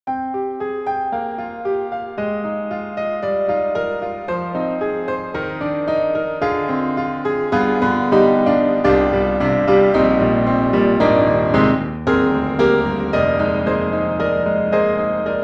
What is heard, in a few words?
Grand Pianos Bosendorfer Jazz Bosendorfer; Synthogy; IvoryII